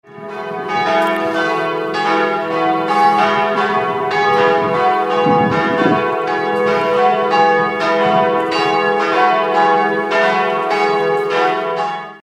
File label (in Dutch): kerkklokken